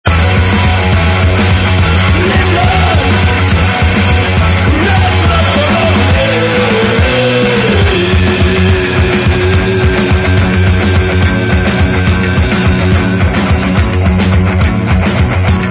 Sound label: BEAT